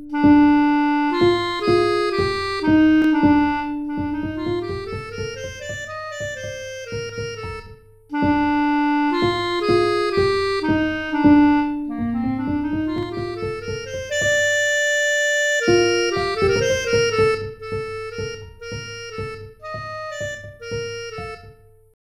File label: clarinet